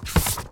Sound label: scroll